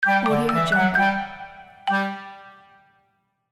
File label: short game end